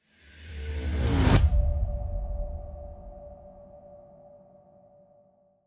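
Music Percussion, Percussion Transition Pre race start; Forza Motorsport 6 Apex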